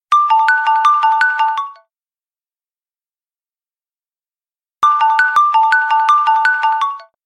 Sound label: text msg new lumia